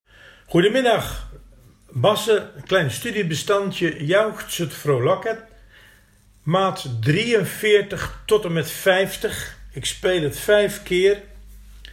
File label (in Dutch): bassen; Jauchzet